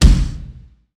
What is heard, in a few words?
Metal drum; AzureKinectExamples; KinectDemos; ColliderDemo